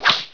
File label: melee miss, monsters beetle; Heretic II